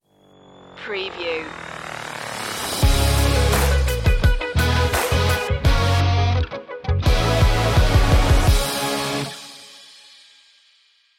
stinger

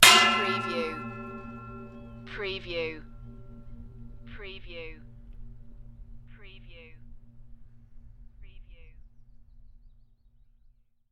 Metal Impact Hit - Gong Like With Long Ring

